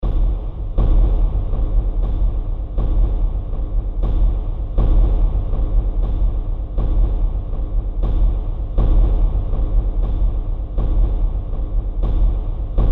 Industrial Drumming, Eerie and haunting deep, dark industrial rhythmic banging; Deep Tones